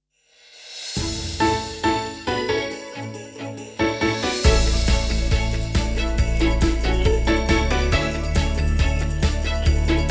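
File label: Sung Jingle Radio Xmas A Major 138bpm Ramp; Radio Insuperavel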